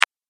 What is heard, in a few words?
Analog Click